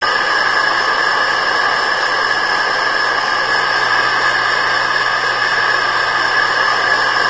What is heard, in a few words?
steam leak, ambience; svencoop; AoMDC